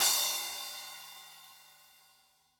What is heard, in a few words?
Crash, drum